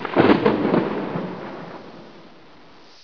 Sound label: portal; svencoop; echoes; outtro